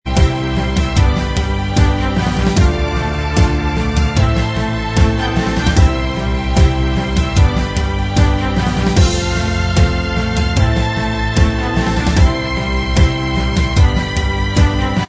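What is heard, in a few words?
Instrumental Track, backing tracks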